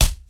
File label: hit; ProjectZombie